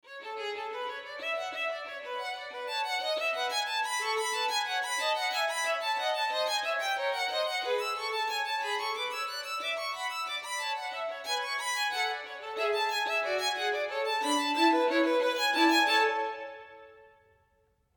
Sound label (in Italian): Duetto Forse Per Due Violini; Chamber Music String